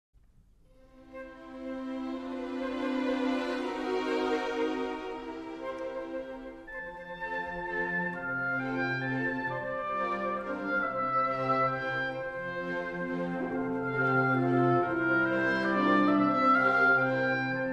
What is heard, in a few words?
Dance Female Variation IV; La Neige; The Seasons